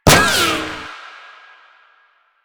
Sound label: Shield Impact; Warframe; Lasrian; Lasrian Tank